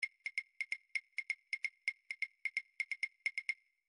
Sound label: individual drums claves, midi